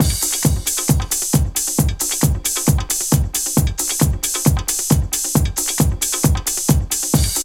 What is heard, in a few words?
DRUM, 132; Megabass Remix! 2